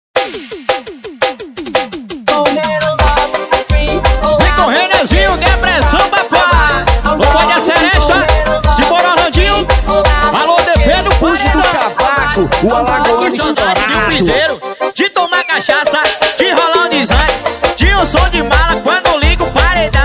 piseiro

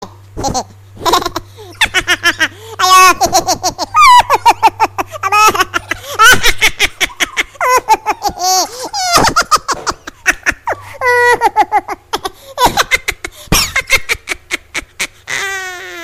Risadinha Engraçada; RMX

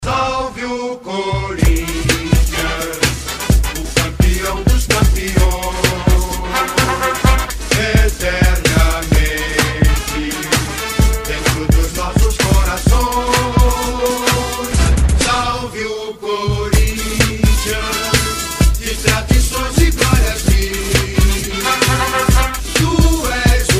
Funk; Hino do Corinthians

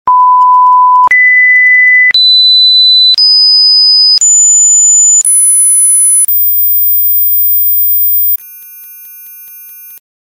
APITO ULTRASSONICO

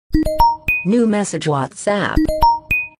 Mensagem Whatsapp

